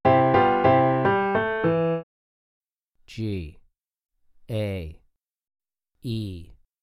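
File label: 3 Note Melodic Piano Major g2 a2 e2; ear training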